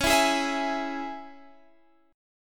Db Minor Db down Guitar Standard; Dbm strummed; JGuitar

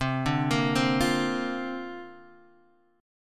C 7th Suspended 2nd Suspended 4th C down; C7sus2sus4 arpeggiated

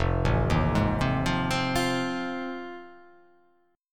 F 7th Sharp 9th

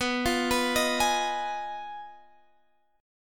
F Minor 7th Flat 5th, B

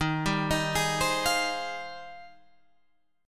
Ab Major 13th Eb

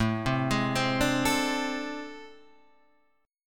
A Minor Major 11th, down, Guitar, Standard; arpeggiated; AmM11; JGuitar

